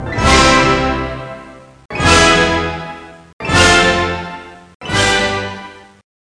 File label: brass up